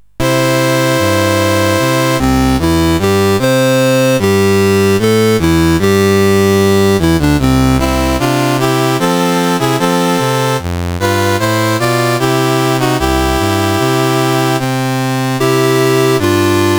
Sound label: Togo, National Anthems, CSG 8580; CSG8580R5